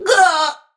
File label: Death; Warframe; Tau; Dax; Ninja